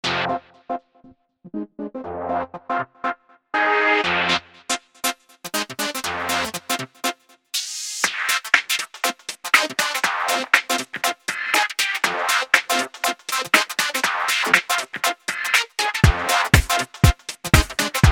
filter controlled by midi; usb ultrafocus

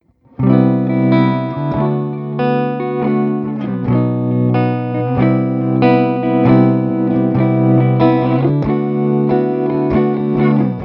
tremolo; line6 spidervalve; effets

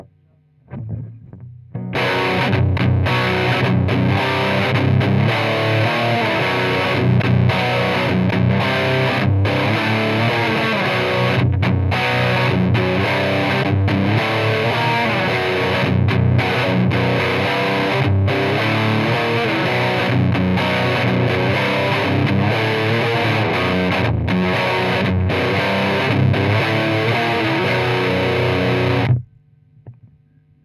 over disto el34 only; brunetti pirata141

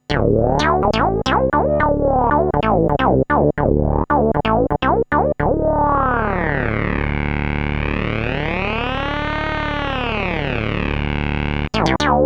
jp 8 sync; Jupiter-8; arturia origin; sans aucun effet; synchros

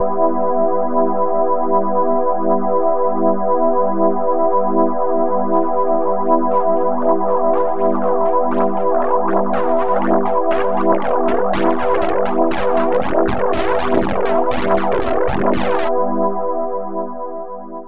audio to filter freq; propellerhead; reason4

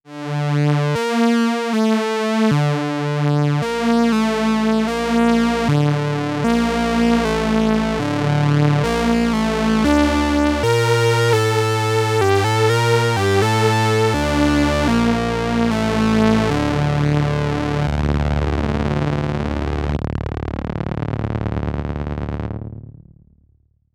add sub fat pwm; davesmith mopho

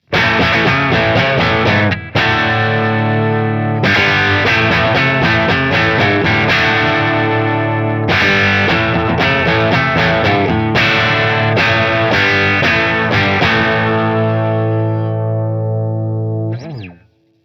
crunch bridge gibson; custom77 cs4

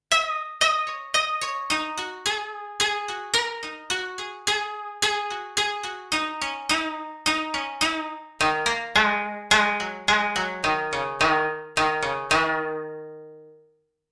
audio koto, kurzweil pc361

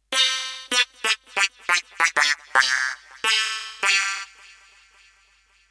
lightwave lead; plugiator

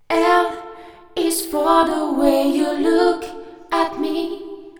voice duet; steinberg cubase 5 variaudio

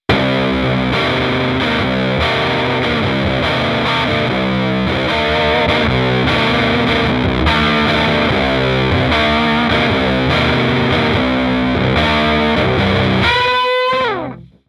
dirty, guitar rig; native instruments

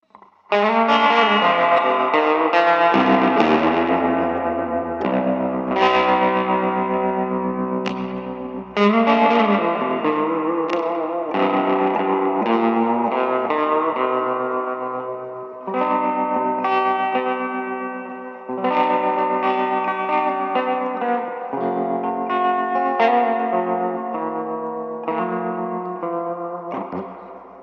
crunch reverb, guitar; native instruments; guitar rig

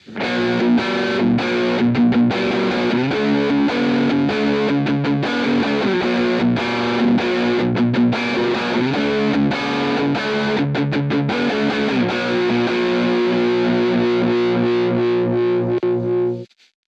hard rock middle; guitare; indie totalnatural

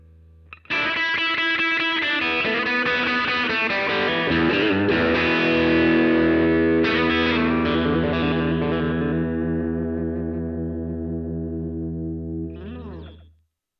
moody tweed; line6 pocketpod

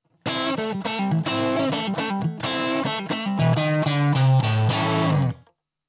crunch; line6; pocketpod

